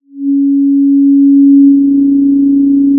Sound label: Sound of a cruise ship horn